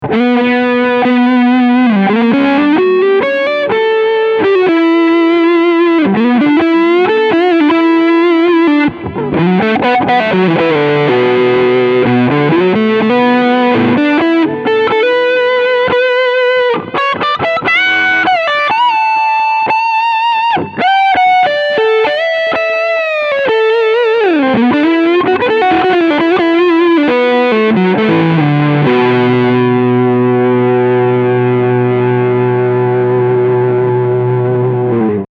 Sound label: Fuzz FULL Mode